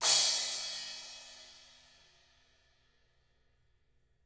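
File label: CRASH 16, CYMBAL; CYMBAL KITS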